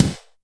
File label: dry bass drum one shot f sharp key; kick drum; kick drum samples